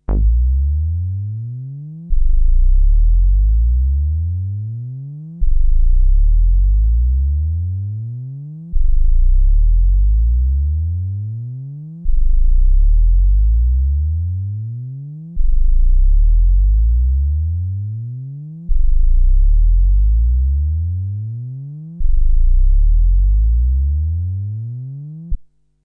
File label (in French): arpegio demi dents descie; 27s; Variations en demi dents de scie; INFRAGRAVE VARIATIONS ET CHUTES DE FRQ - Built for SPL